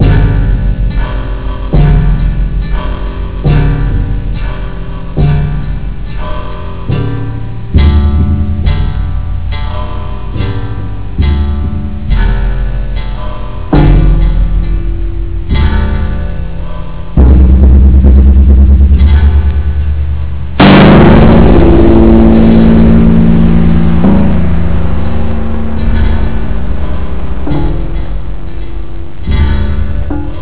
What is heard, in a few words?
gong; synthgramelodia